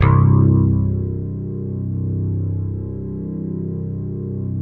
rockin bass; Greytsounds; Scott Peers Sound Engineering

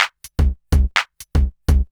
124bpm, House Perc Loops; E Lab; XTc Files of House

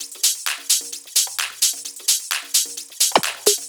Handy Tops, 130 BPM, Percussion; Sonic Foundry (Sony Creative Software); Underground UK House & Electro

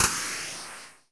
sfx sci fi; Masterbits - Switch FX

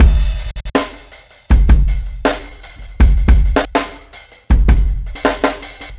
Marching Kit 4-Bar, Breakbeats; KJ Sawka; Drum n Bass & Beyond

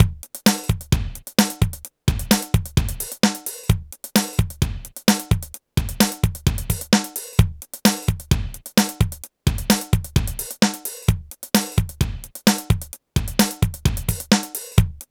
rock; INIS; Funky Xtreams

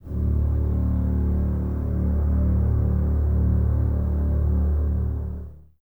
Basses D 1, String Ensembles; Lo+Top; Miroslav Vitous; 90 s Sample CDs

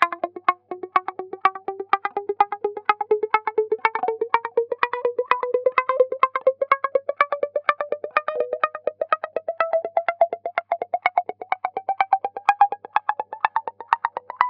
Guitar Wah Loop Rise, 125bpm; Zero-G; Nu Disco

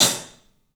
club hat; 3D Sound; 3D Drums

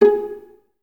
Violas G#4 Pizz; Miroslav Vitous; String Ensembles

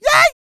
A YELL, MALE HUMAN; ILIO; Vocal Planet

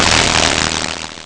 splat; Amiga Soundtracker Sample Packs

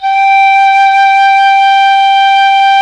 FLT PICOL, Flutes, Picolo& Flt

